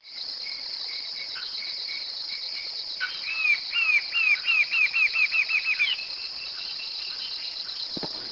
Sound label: Thamnophilus ruficapillus Choca de chapéu vermelho